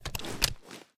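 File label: shotgun reload empty start end; reloads; iw8; romeo870; gmod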